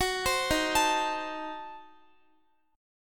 Eb Diminished 7th, Guitar; Ebdim7 arpeggiated

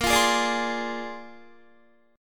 A sharp 9th A sharp Guitar; strummed

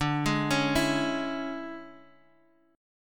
C sharp Minor D; C#m/D; arpeggiated; JGuitar

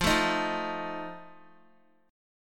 F 7th, Guitar; strummed; x,8,7,8,5,x

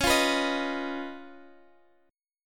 Db 9th Db down Guitar Half Step Down

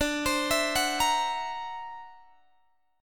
D Augmented 9th down Guitar Open G

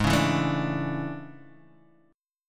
A Major 11th; chord

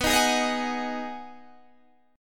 B 6th B down Guitar Open D

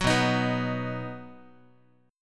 B Major, Guitar, 4A,4E,5A,5D,5G sharp,6C sharp; strummed; JGuitar

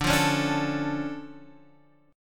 D Minor Major 7th D down Guitar Standard; DmM7 strummed; JGuitar

